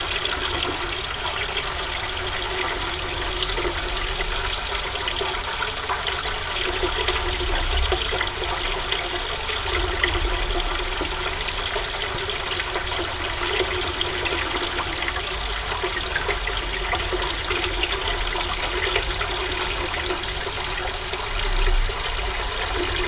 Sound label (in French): fontaine